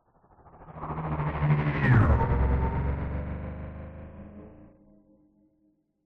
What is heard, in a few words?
science fiction hovering vehicle pass by fast